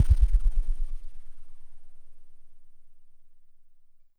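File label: Mono Bass DX100 C#2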